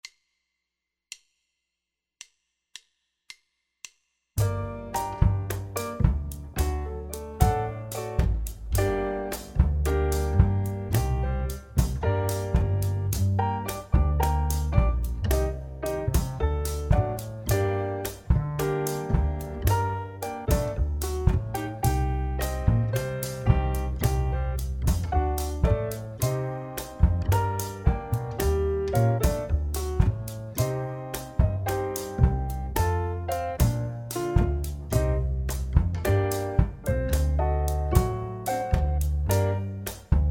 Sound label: A Minor Indulgence Backing Track, flute